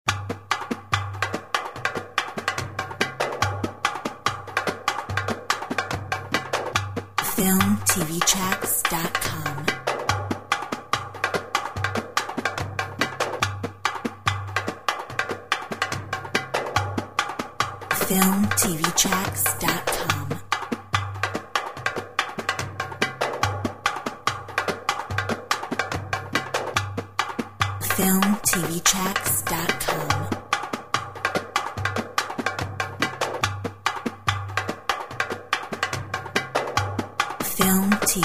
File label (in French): Danse orientale